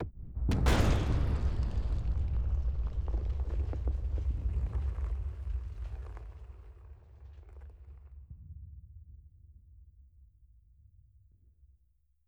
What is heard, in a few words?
Gate Explosion; adams venture origins